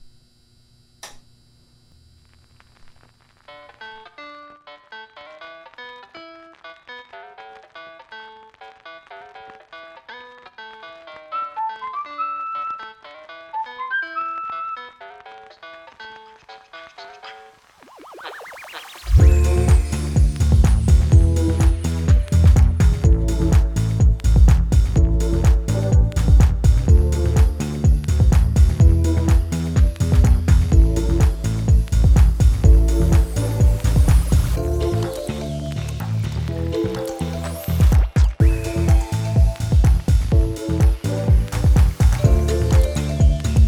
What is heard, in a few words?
Lo-Fi House Mix; nintendo lo-fi megamix 2023; Animal Crossing - City Folk